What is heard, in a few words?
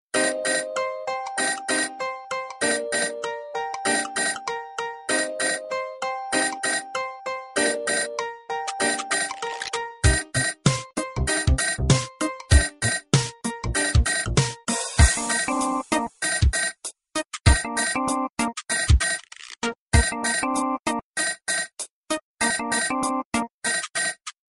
Musical Bell